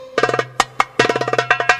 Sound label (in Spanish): maracas